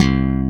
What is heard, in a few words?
CHOP C2; SAMPLE WAVE MIXING 1